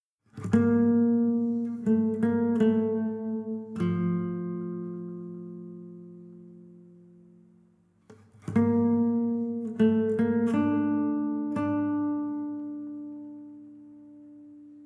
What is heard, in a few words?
Savannah Music; kenshi windows gamerip 2018